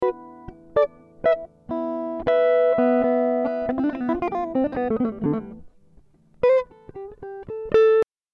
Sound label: Am60 GTR impro